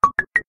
Gentle Tapping, notifications; Infinix XOS 12

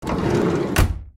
Car MMC removal; Nokia; audio themes

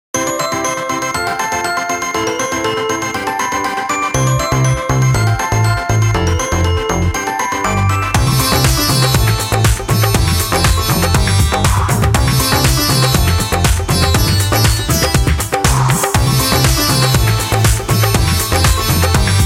Punjabi, Nokia Tones; Windows Phone apps

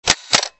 Camera; Xillion; GC320

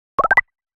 Plops; Nokia; Windows Phone apps

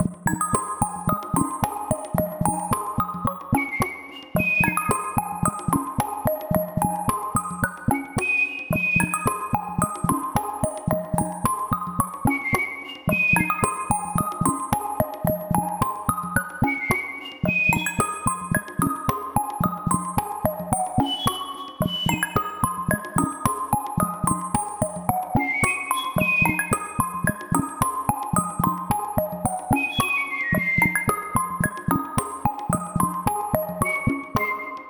Ping Pong, ringtones; Motorola; Edge 70 Max China

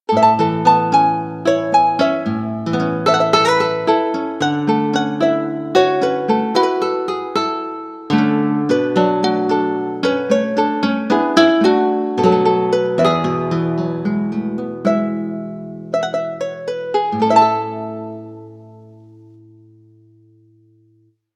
Acoustic; Coolpad Tiptop N2M

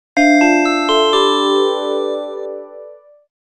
Carouselle, Nokia Alerts; Windows Phone apps

